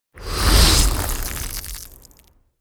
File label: Cinematic ice freezing transition; Trailer Sound Effects